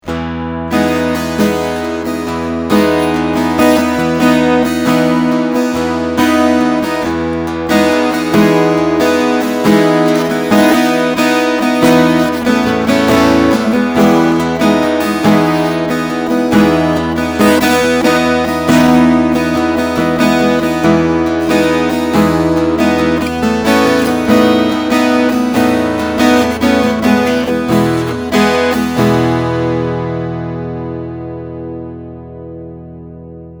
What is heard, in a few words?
Strumming EQ half